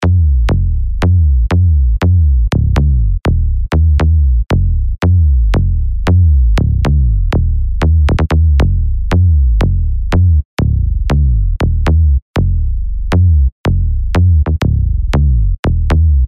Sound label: SLIDE